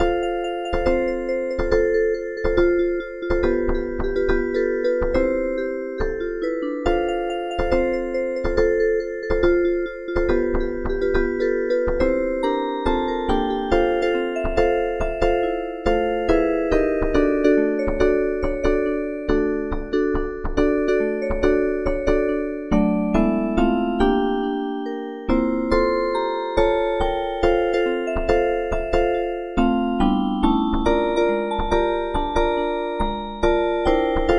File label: musicbox